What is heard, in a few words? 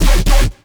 Alt Fire Growl, Infested, Arm Cannon; weapons; Warframe; Coda Bubonico